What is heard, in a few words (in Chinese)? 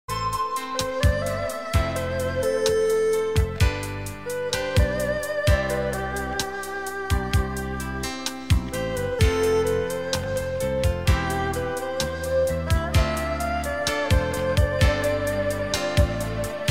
广告片音乐